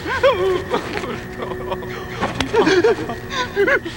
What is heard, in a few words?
CRYING